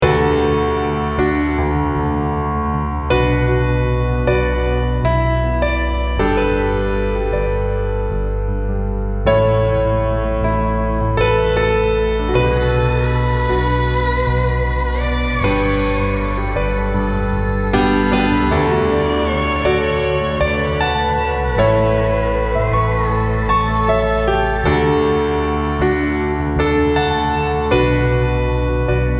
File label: Healing Melody